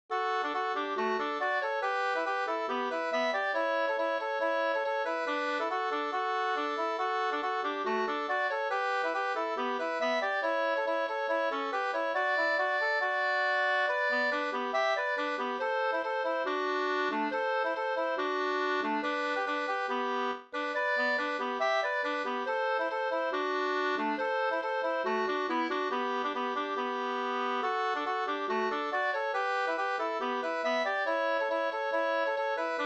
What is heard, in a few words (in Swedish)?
polka D G dur